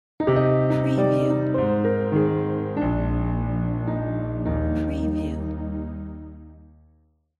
beautiful logo

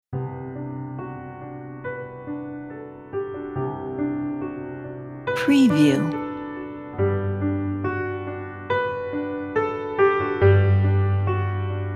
romantic piano

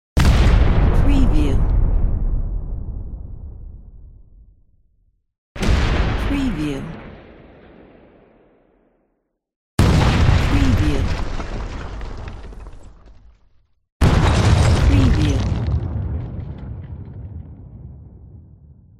explosions

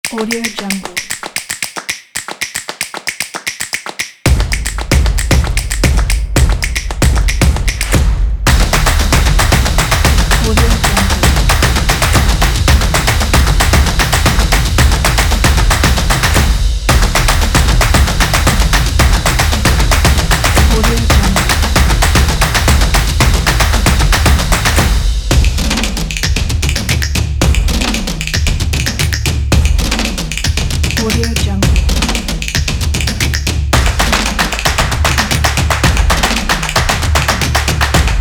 fast opener

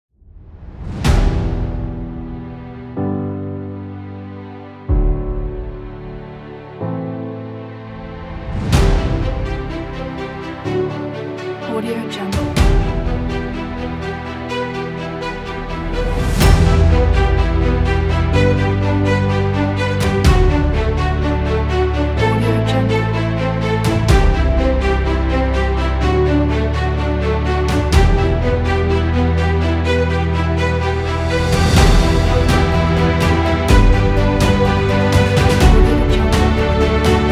epic orchestral trailer

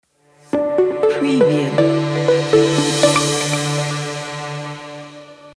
minimal logo

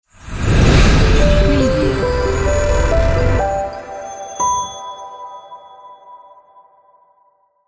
magic inside

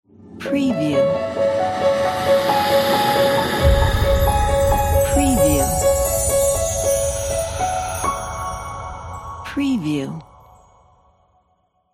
breeze spirit logo

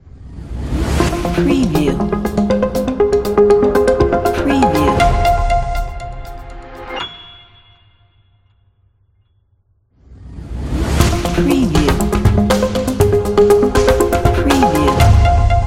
logo ident soft

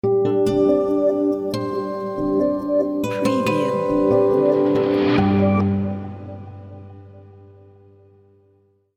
elegant corporate logo

